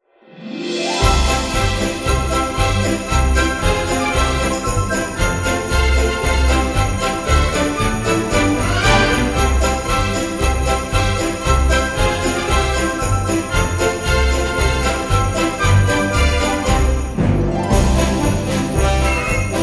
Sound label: jingle bells orchestra